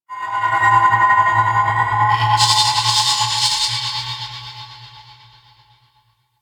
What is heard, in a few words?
Metal Groans And Scrapes, Asteroid; Ambience; Warframe; Grineer Asteroid